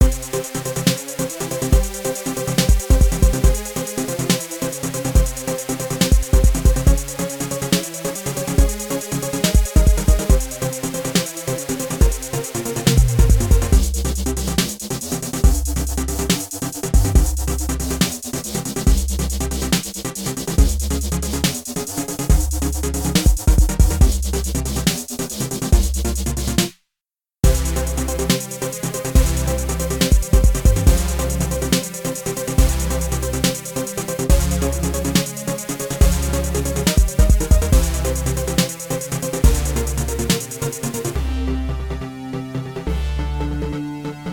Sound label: Techno